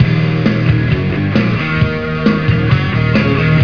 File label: loop